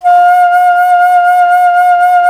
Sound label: Alto Flute